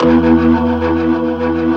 E2, Keyboards Old School; USB Soundscan vol.09; AKAI; BIG HAMM

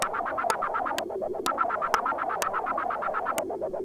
Juno-60 Arp 125BPM

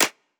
clap, hip-hop drum kit; 99Sounds x Monosounds; Moon

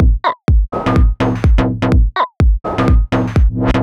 125bpm, House Drumloops

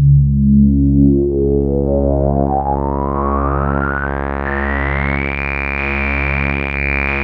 Prophet 5 C#2; Hollywood Edge - Giorgio Moroder Rare Synthesizer Collection

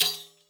RIQ ZIL, HAND DRUMS; SoundBytes - Early Patches

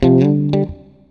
STRAT GT; Synclavier Sampler Library; KEYS AND GUITARS

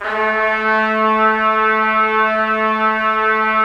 Trumpets; Roland - LA Composer vol. 4

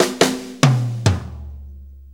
6-8 Fill, Drum Tools

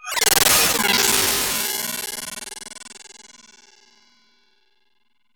FM FX, synth

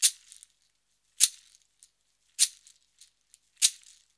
Modern Percussion Loops; Soundscan vol.56; AKAI

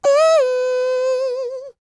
MMM, Vocal Blues Soul; Spectrasonics; Planet

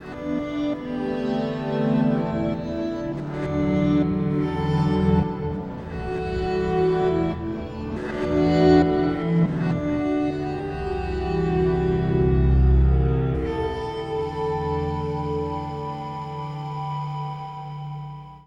Scree Song, Acoustic Drone; Sonic Foundry (Sony Creative Software) - Friendly Fire; DroneLoop

